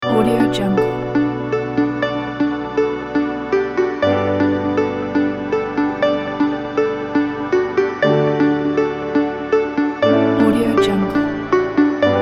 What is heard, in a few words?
motivational corporate